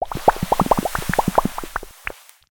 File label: Bubble Blower Item